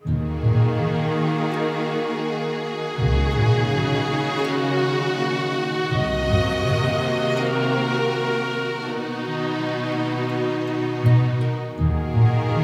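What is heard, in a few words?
Game Menu, 82 bpm, E flat minor; 4/4; Strings + Woodwinds + Harp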